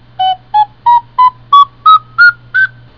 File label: scale